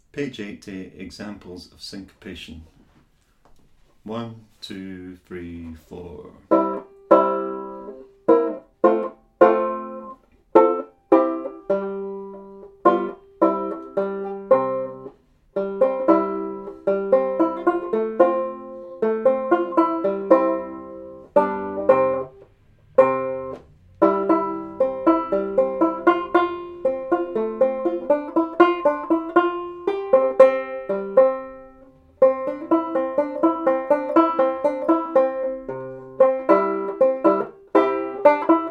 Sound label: examples of syncopation; Bradbury Studies